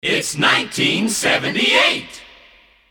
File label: group shout its 1978, jingles; 1977 christmas kit